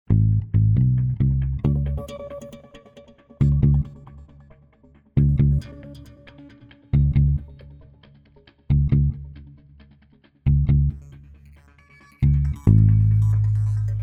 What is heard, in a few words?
Bass Comedy Suspense